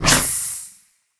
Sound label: snake attack; Smite; Baron Samedi